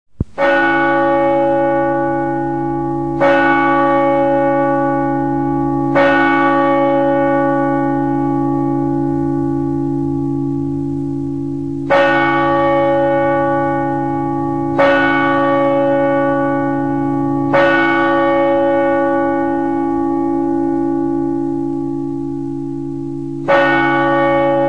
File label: Angelus bell